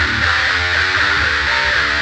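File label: Guitar (metal)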